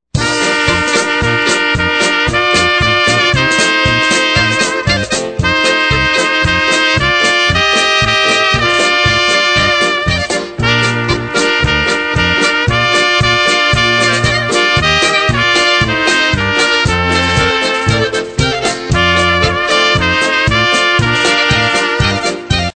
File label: POLKA